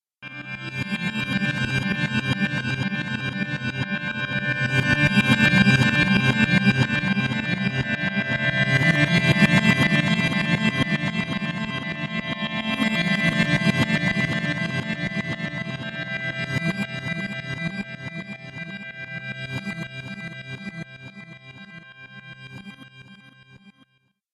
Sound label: Dimensions Future Vortex, Sci Fi Music